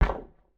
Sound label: Alien Weapon Shot; Game Weapons; Military; Game Essentials